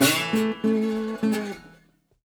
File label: Blues Guitar Samples; ILIO - Fretworks